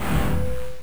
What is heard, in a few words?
scrape; madcars2; radicalplay